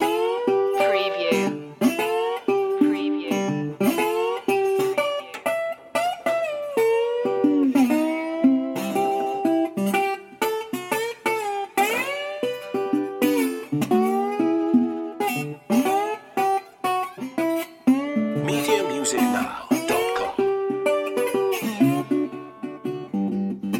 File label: star spangled blues loop